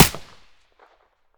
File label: aps silencer stereo; STALKER; IWP